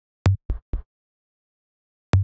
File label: Drum Loop 128BPM Toms; VEC5 Drumloops; VEC5 Multi Loops; Loop Elements